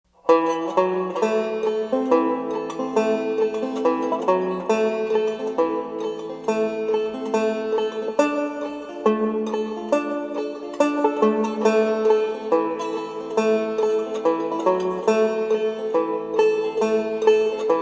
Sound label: Old Time Banjo